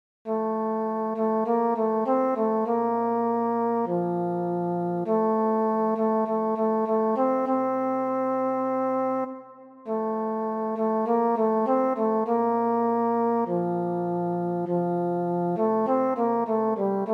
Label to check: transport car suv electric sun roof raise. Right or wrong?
Wrong